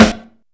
snare single hit a key

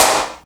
perfect acoustic snare sample D# key

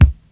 studio kick drum sample c sharp key

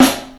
old school solid steel snare drum c key

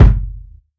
rich low end kick F sharp key; kick drum samples; one shots

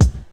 2000s prominent bass drum sound c sharp key; kick

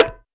boom snare drum sound a key

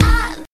silky bass drum D# key; kick drum samples

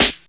90s hip hop snare one shot e key

